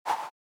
noise Adsr; Smith Angell

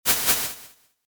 modulate Noise Adsr Delay; FSound

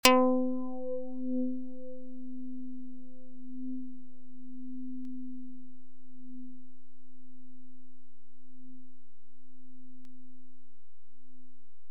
karplus Strong Flanger; FSound

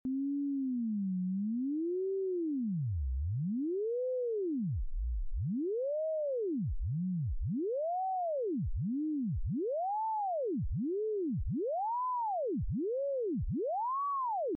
blowing Ever Harder; FSound

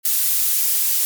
noise Hp; FSound

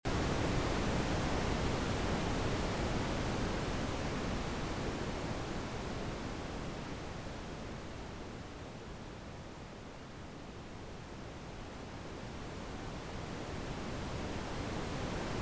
noise Lfo; FSound

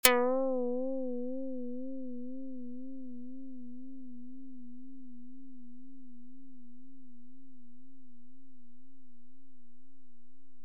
karplus Strong Vibrato; FSound

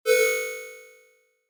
saw Chorus Adsr Delay

